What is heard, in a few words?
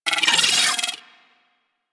Arachnoid Micro Vocal Attack; Warframe; Corpus